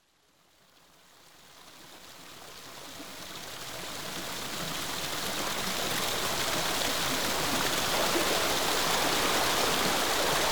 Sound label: River lock filling- River Thames, Water; The BBC Sound Effects Library